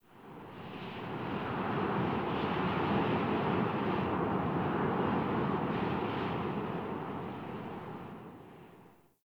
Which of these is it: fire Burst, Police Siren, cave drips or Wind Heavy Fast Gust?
Wind Heavy Fast Gust